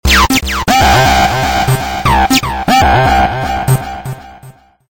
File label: 3d Acid Tone